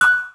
coin; Knights-Diamond

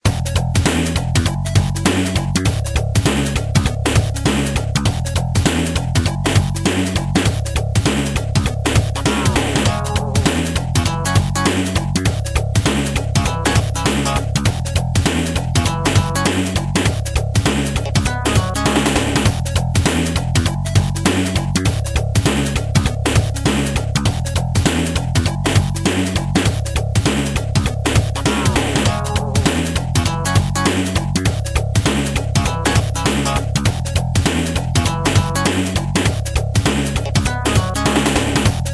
gamemusic; crazy runner

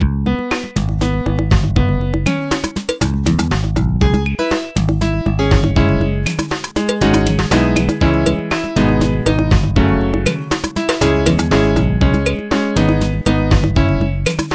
gamemusic; billards

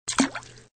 hook; fishingfrenzy

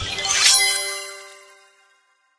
match; block super match

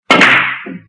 hit, billards

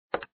trample; cowboyrun

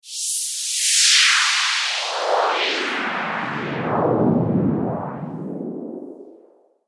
shield music; Chase-Racing-Cars

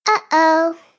fail; gomoku

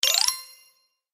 paddle increase; brickout

